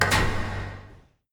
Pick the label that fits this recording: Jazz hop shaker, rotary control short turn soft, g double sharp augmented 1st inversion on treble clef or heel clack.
heel clack